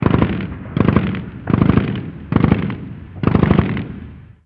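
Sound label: gun us assault medium; ModernWarfare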